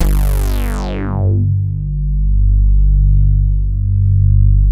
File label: reso pad; Sound Gallery - Charged